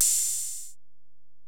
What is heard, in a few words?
HiHat, Alesis DM-PRO